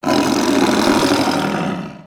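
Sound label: Sabertooth Howl; creatures; pets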